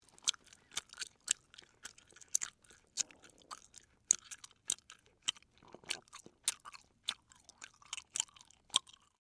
Chewing Gum Chew, Human, Chewing, Gum, Chew, Stick; Eating Sound Effects; Blast Wave